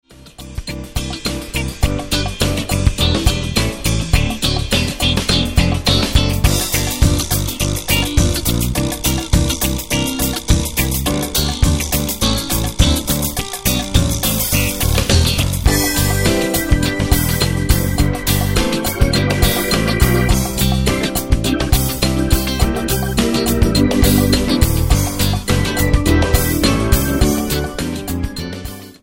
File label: PLAYBACK Over In The GloryLand